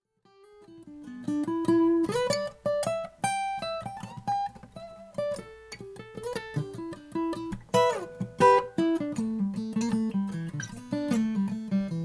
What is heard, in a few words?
ryan paradiso grand concert cocobolo